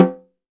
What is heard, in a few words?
Snare Drum; Percussion Samples